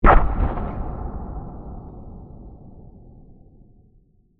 Explosion Artillery, Shell, Distant; Warfare; Bombs; Blast Wave

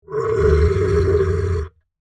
Monster Groan, Horror, Monster, Groan, Creature; Monsters; BlastWave

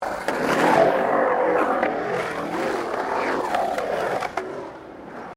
Skateboard Skate Bowl Round Bowl; Skateboard sounds; Skateboarding (Sound Effects:Sports); SoundEffects-Foundation4

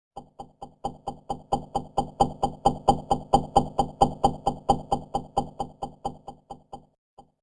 Slow Whoosh Almost 8Bit

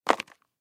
High Heel Shoes Drop; High Heel Shoes Drop Carpet; Foley; Blastwave

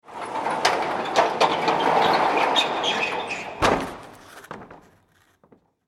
Industry Warehouse Door Close, garage door; Doors; SFX Bible 6

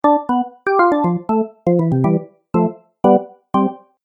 Organ Game Music; Cartoon Music Sound Effects

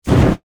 Flame Thrower Burst, Short; Warfare; Miscellaneous Combat Sound Effects

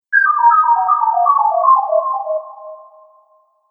Scifi Game Fail; Video Game Sound Effects

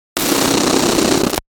Fast Static Noise Blurb; Production Element Imaging Element Accent Transition

